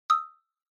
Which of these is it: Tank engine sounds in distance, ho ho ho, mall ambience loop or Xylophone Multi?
Xylophone Multi